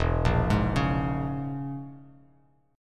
E 6th Flat 5th Bass Standard, E6b5 chord; arpeggiated; JGuitar

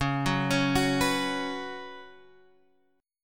Db 7th Suspended 4th Db down Guitar Standard; JGuitar

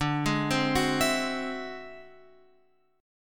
D 9th Flat 5th D

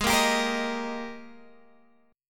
Ab Major 7th Suspended 2nd, down, Guitar, Open G

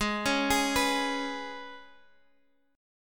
Ab Minor Double Flat 5th, Guitar, Half Step Down

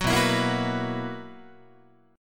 B Major 7th Suspended 4th B, Guitar; strummed

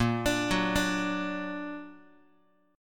Bb Augmented Bb Guitar; arpeggiated; JGuitar

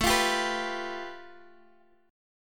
A 7th Suspended 2nd Sharp 5th A

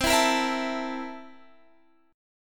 C 13th C

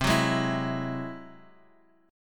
Ab Augmented 7th C, guitar

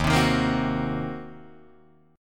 D sharp Minor 6th D sharp down Guitar Drop D

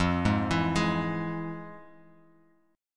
F Minor Major 7th, chord; x,8,6,9,8,x,x,x

